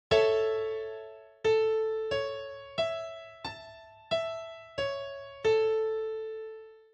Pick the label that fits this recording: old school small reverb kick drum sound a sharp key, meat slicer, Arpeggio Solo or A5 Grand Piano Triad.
A5 Grand Piano Triad